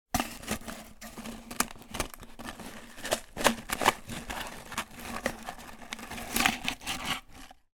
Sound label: food stackable potato chips remove from tube struggle; pringles